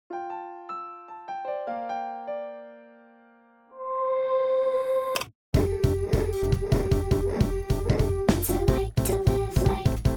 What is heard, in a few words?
without vocal chops